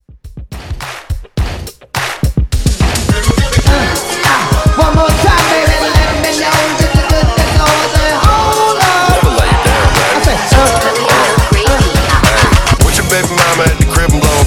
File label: Hip Hop Re Drum 105 Bpm